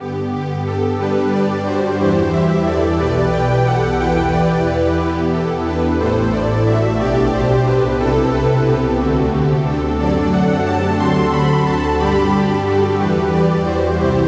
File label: halo pad